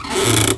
Tiki Sign Turn; Finding Nemo; XBOX

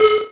funpark light floor; Tony Hawk's Project 8; XBOX

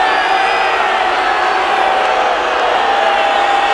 crowd boo; Totaled; XBOX; crowds

